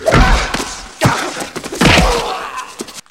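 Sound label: hits and shouts during fight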